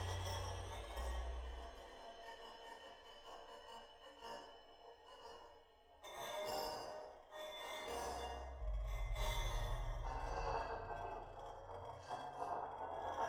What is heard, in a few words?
concert ambisonics